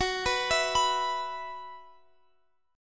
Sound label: Gb 7th Suspended 4th Gb down Guitar Half Step Down